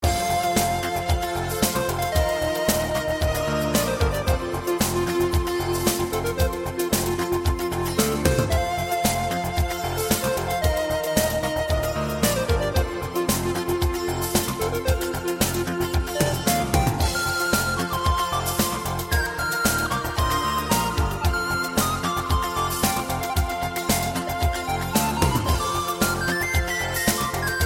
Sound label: Nice melody ringtone